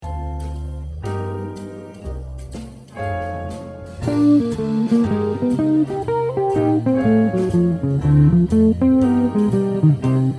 Lick

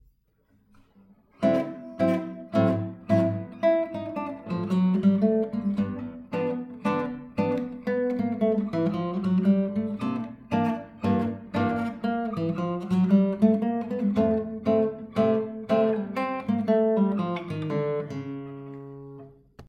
Lick

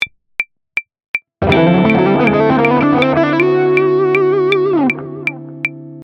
Lick

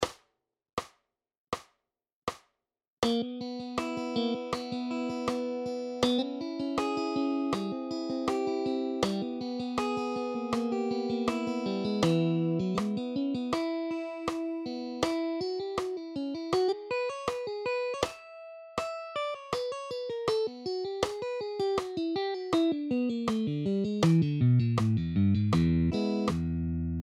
1st solo BPM 80

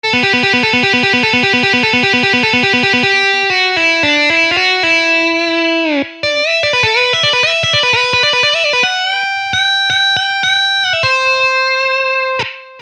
Lick

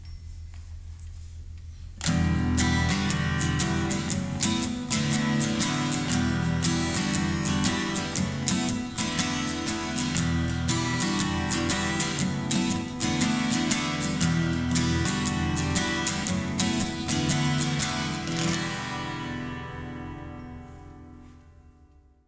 Both Guitars

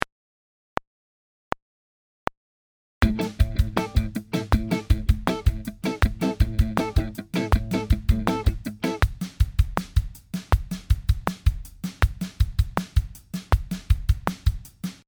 groove

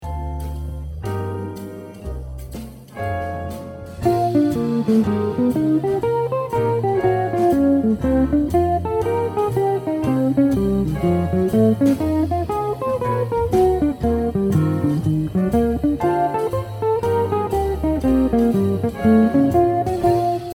Lick

